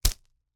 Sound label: slingshot, dry firing, slap, rubber, rustle, shot